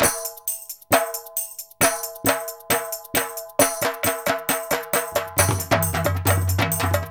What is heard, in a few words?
GARBA